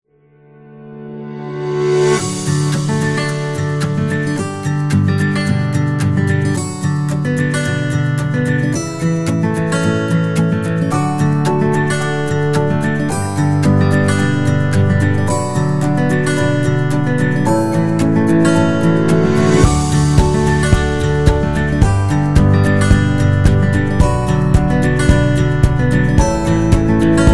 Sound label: Acoustic Summer